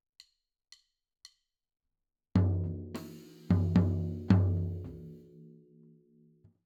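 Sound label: Floor, tom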